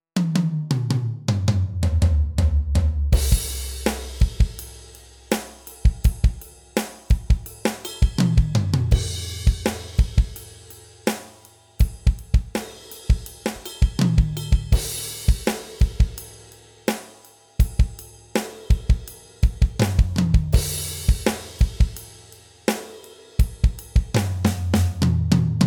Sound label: Oak Custom slow